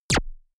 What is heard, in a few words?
gun shot silencer synthesized zap